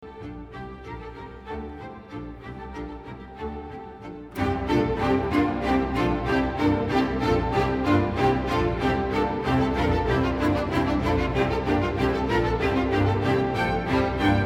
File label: Three Pieces In Old Style II